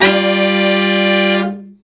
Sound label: BRA SECT; BRA SECTIONS